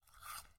eat bite; angband

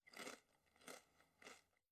bite chew; angband

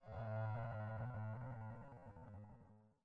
strange music; angband

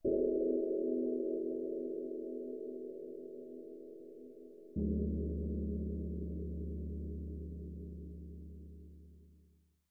bell tibet; amb; angband

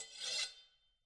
metal sharpen; angband

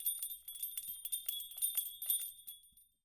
bell jingles; angband

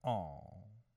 "aww"; angband

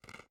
bite munch; angband

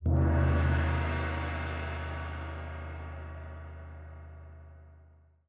gong low; amb; angband

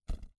drop boot; angband

